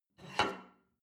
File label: plate take table slide hard; berufe; soundpool